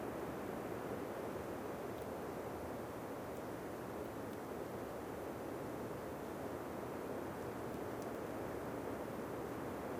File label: Amb Winter Base loop